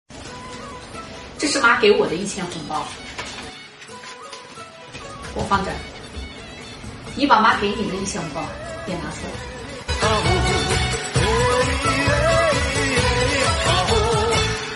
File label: laugh; breadradio